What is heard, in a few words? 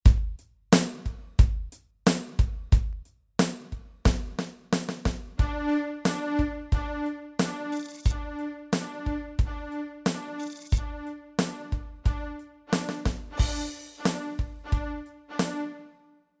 Bowing on D